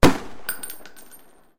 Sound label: gun gunshot; Mazebert TD; android